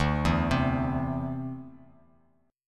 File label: D 7th Suspended 2nd, D7sus2 chord; D7sus2 arpeggiated